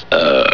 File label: burp